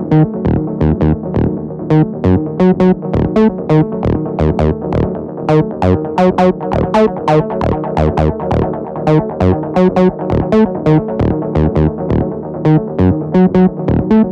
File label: Synth 134BPM D Analog Saw